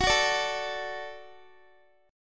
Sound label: F sharp Minor Sharp 5th F sharp Guitar; F#m#5 strummed; x,21,18,19,21,x